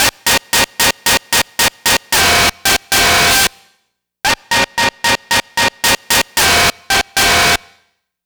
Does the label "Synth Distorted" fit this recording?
Yes